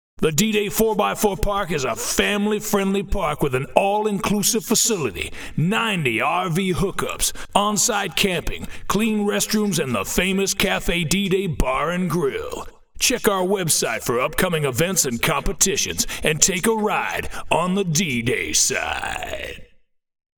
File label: LONGVERB